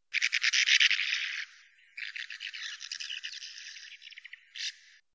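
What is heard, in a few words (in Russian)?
beregovaya lastochka golos, sand martin voice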